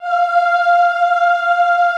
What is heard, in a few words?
AHH F4, Classical Choir; Best Service; Extended Classical Choir